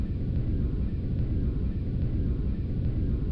ship engine sound

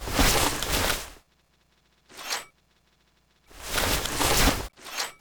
at4 reload

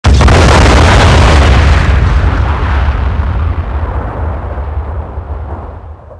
dynamite explode

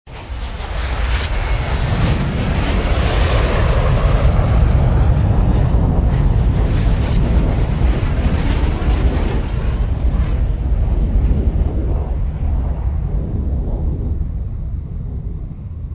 f16 low pass

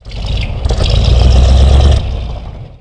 tortoise start

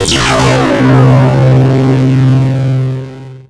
superweapon fire

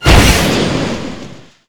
rocketsquad fire; nod

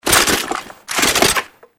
reload commando rifle; gdi

